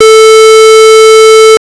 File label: Square wave